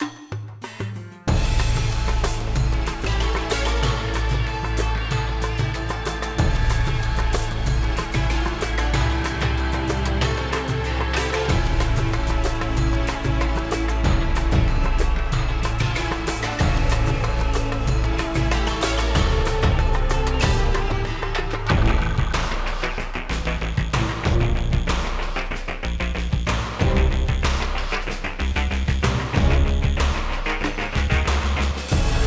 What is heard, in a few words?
Embassy Action Low; modern combat 2 black pegasus; anroid